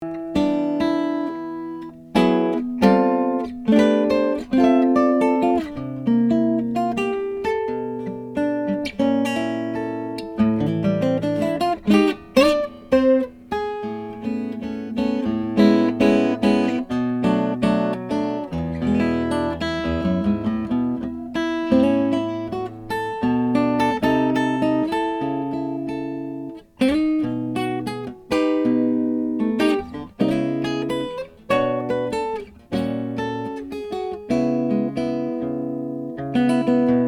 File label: hemken hybrid big leaf maple